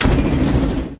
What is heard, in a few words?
train splat; CrossyRoad